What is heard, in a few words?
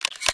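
grenade load, weapons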